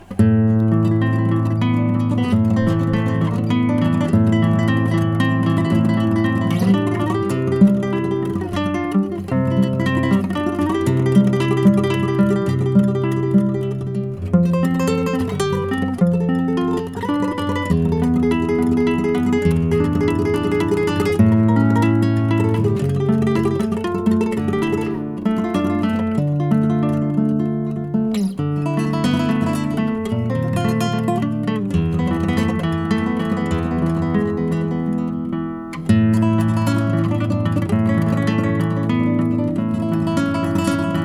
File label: Acc Guitar